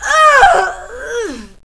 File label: death, female; kingpin loc